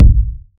fat kick single hit a sharp key, kickdrum sound tuned to the A# note; kick drum samples